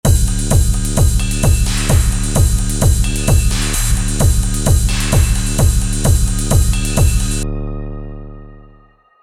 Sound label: minitaur techno